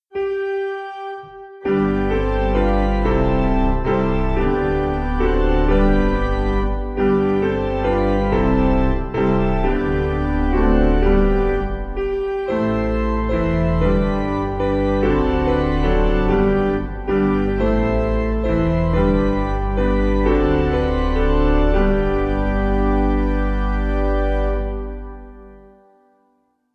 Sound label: piano, hymn